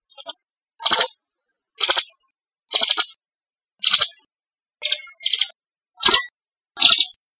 pijantung gunung

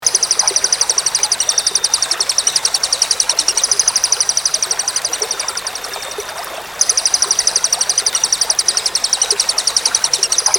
cililin

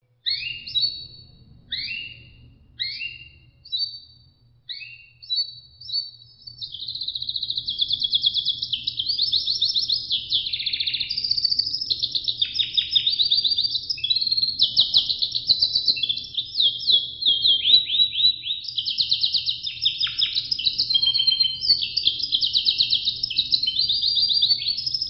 suara sanger x kenari rusia

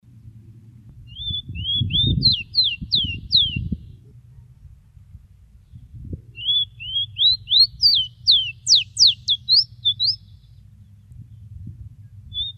suara burung rowti

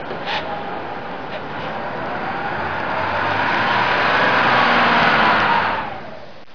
suara mobil

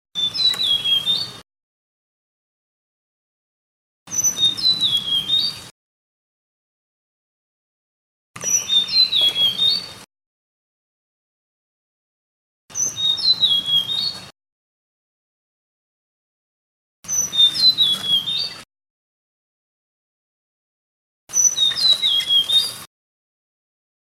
suara burung sikatan hainan betina

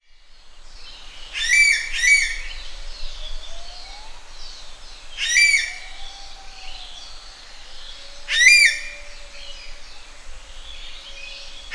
suara burung ekek layongan atau cissa chinensis

